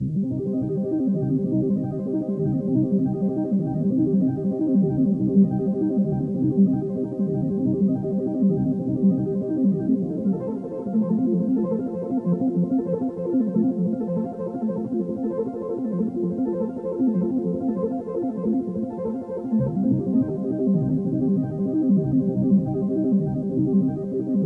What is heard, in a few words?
enigmatic dance 432 Hz